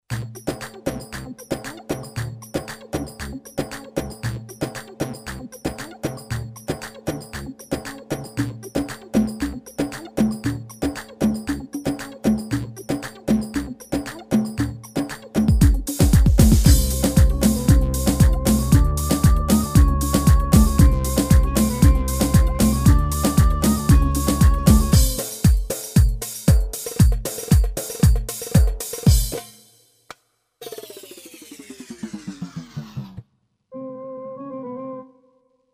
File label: synth, yamaha psr a300tr